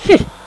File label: "HMMPH"; gemiti esclamazioni mugolii